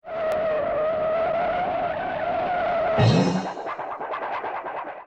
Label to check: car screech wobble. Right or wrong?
Right